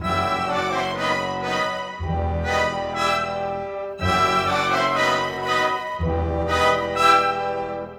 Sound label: Orchestra; Sonic Foundry (Sony Creative Software); Orchestral VOL-2; Modern 35